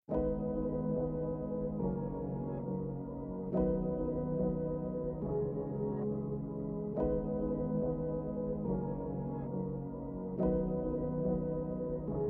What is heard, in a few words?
140BPM G m Major Loops; Pink Cloud